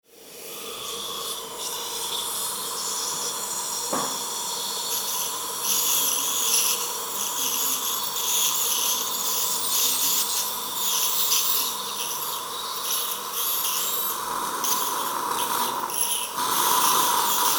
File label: aspirator and drill